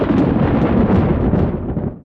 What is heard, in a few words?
Thunder